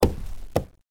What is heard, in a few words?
DBL BONK